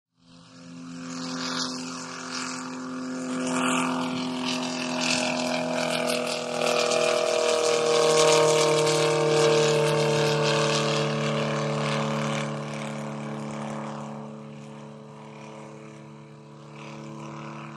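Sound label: Cessna Approach Long Fade, aircraft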